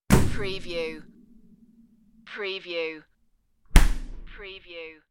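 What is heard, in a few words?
Fridge Door Open Close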